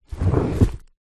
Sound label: household fabric storage box drag on carpet